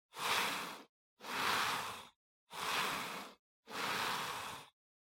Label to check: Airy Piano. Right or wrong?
Wrong